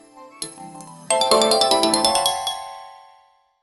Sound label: luxury slot machine spin